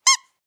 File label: Squeaky dog toy